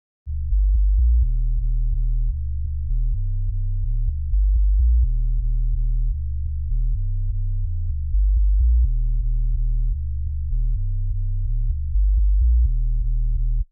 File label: Sub Bass, 126 BPM G; Future House; Big EDM; Future House Tools; Construction Kits; Snow